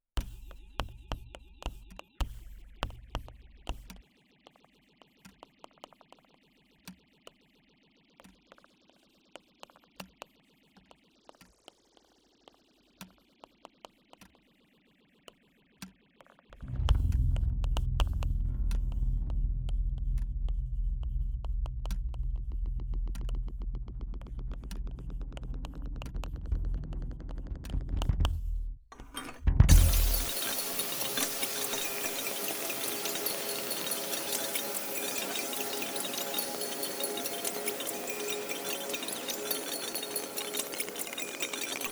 Tous les stems de la version « Mix », après traitement

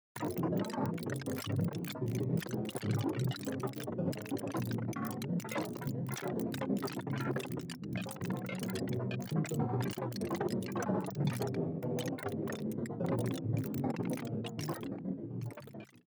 Stem 7, version « Mix », après égalisation dynamique; Stem 7, version « Mix », avant égalisation

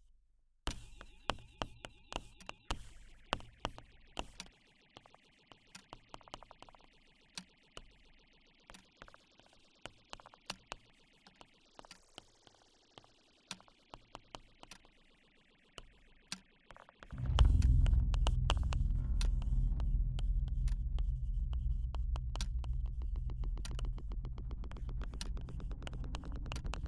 Tous les stems de la version « Mix », état initial

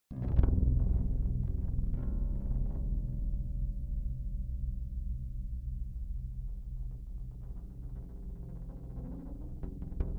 Basse électrique, version « Source », avant égalisation

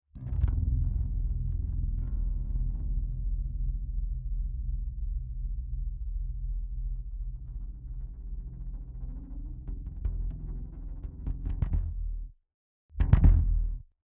Basse électrique du stem 4, après égalisation dynamique

